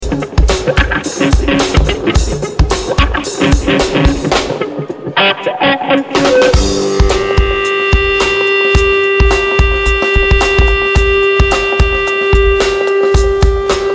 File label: Drum Dub